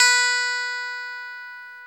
B3 Strat, clean; InVision Interactive; Lightware VOL-1; Instruments & Percussions

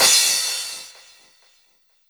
CRASH, Dance Mega Drums; Best Service

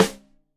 Rim Shot Stereo, drums

